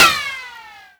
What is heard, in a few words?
ricochet, bullet impacts; physics; Half Life Model Pack